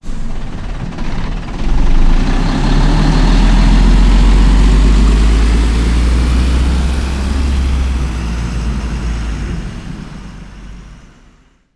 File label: old slow truck starting